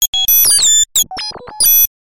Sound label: science fiction computer glitch or malfunction